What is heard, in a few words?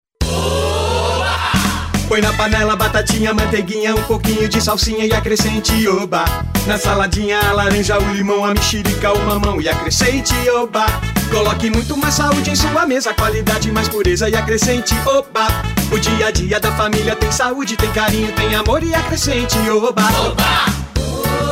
jingle; OBA